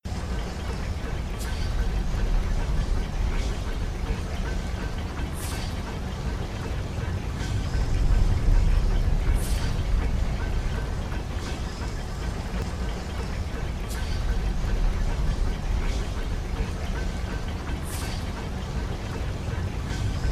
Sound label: gears; PhoenixCraft-1.16.5; ambience music